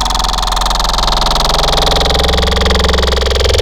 Dat Wet Wet, Wavetables; Cymatics; Essentials Wavetables; Serum

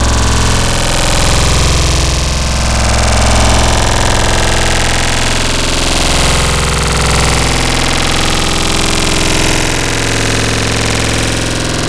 Growl Wavetables; Shottas; Cymatics; SERUM; Virus Wavetables

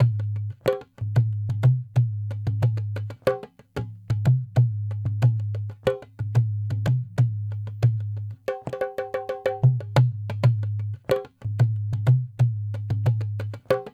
dholak 092, Hip Hop India; Hip Hop India Modern Percussion

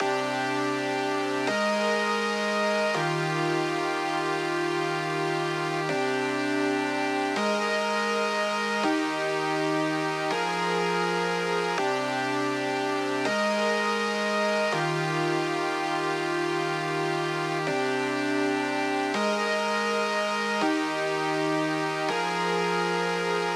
163 BPM C Min Chords; Cymatics; Destiny Gold Expansion; Mirage

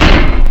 Blast, Foley; Cymatics; Foley Wavetables Vol 1; Serum

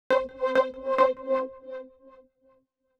Synth, 104 Cmin; Hip Hop

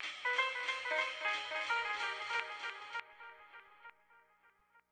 Stab; Thirty-One Flavors

